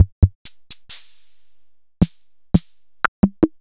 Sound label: MFB 301; Fricke